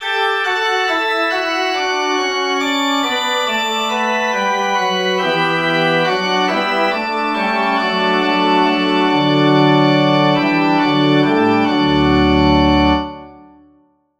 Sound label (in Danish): forspil